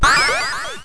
Weapon Upgrade; Io

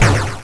Turret Death; Io

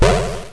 Turret Fire; Io

